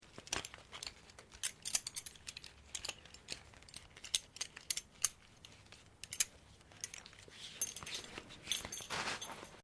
Belt Leather Do Up; Movemement sounds for various articles of clothing; Dressing (Sound Effects:Human)

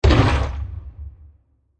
Small Deep Impact; Blasts; Impacts; CraftedSignals

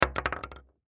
Bullet Shell; Blastwave

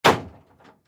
Closet Door Close Interior; Household; Closet Doors; Blastwave

